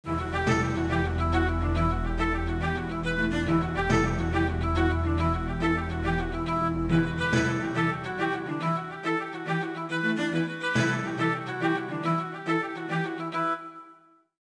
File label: Background Music